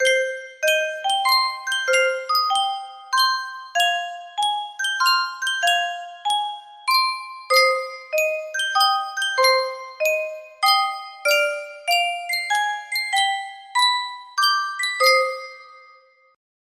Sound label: yunsheng custom tune music box, music box melody